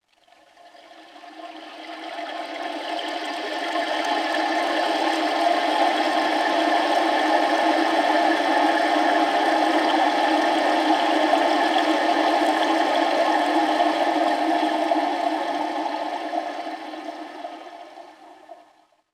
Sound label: Water Stream Trickle; Chalice Well